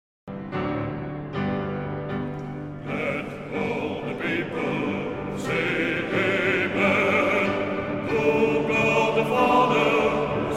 Amen Hallelujah, mannenkoor

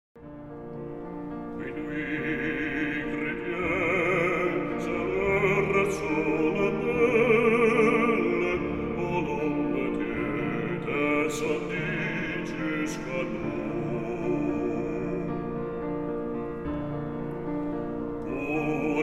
Cantique de Noel, mannenkoor; Het Hervormd Mannenkoor IJsselmuiden/Grafhorst

